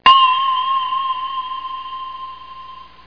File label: Bells; Electronic Whole Earth; MUSIC